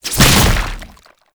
tank infested charge tentacle whip; Enemies; Abilities; Warframe; Lasrian Tank

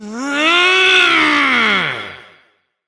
Evil Twin Doppelganger Frustration

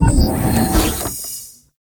Elevator Case Close; World Seeder Descent

